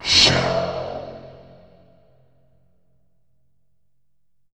SSSHH, Tubevox Wet; Effects

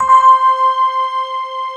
Fender Violin, Fender Pad; Optical Media International; Sonic Images Library

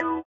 sitar, Indiatek; Spectrasonics; Metamorphosis

